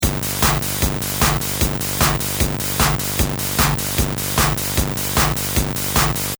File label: Rhythm Pattern plus Bassoutput, Tr 505